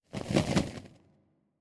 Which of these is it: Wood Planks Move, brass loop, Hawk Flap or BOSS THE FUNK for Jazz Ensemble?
Hawk Flap